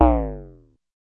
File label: gameover; draw the path